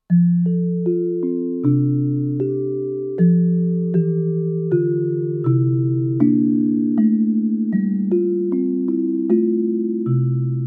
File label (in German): BM 1600 - mit zwei Schlägeln; studio-49